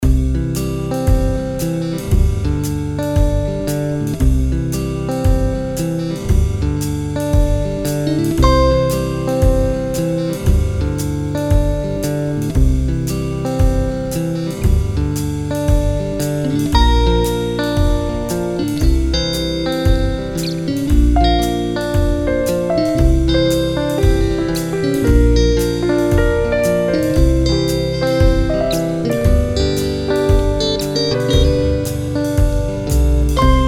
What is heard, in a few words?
music forest song; Garfield Survival of the Fattest; Android